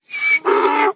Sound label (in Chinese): 驴愤怒声